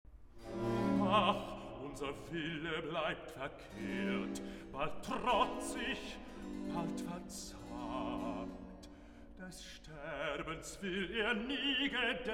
Rezitativ; Ach, unser Wille bleibt verkehrt; Bach; cantatas; Herr, wie du will, so schicks mit mir

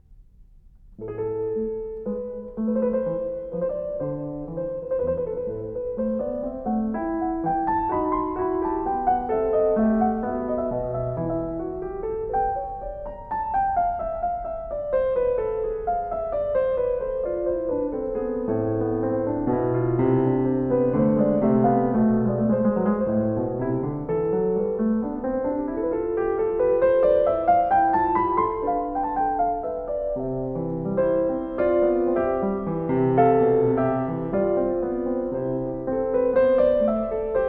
Zweistimmige Inventionen a-Moll; Zweistimmige Inventionen XIII) a-Moll; BWV 799; BACH; Inventions

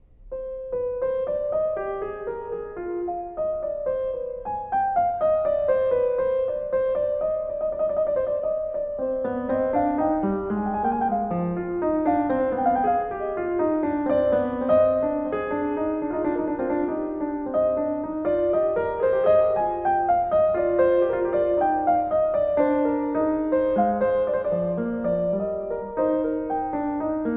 Einstimmige Inventionen II c-Moll, Klavier; Inventions; BWV 773

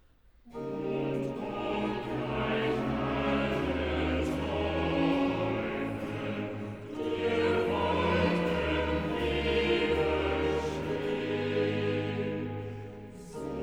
Choral, cantatas; Und ob gleich alle Teufel; Bach; Schau, lieber Gott, wie meine Feind

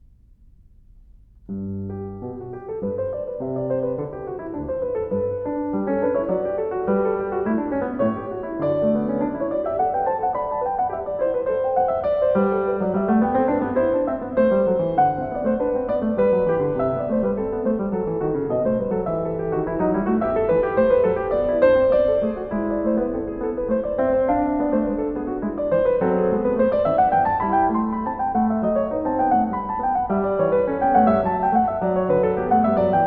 Zweistimmige Inventionen G-Dur, klavier; Zweistimmige Inventionen X) G-Dur; BWV 796; BACH; Inventions

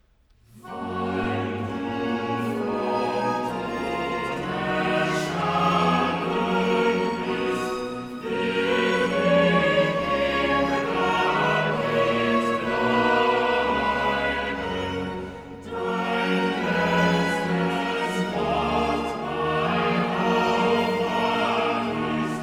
Choral, cantatas; Bach; BWV095; Christus, der ist mein Leben; Weil du vom Tod erstanden bist

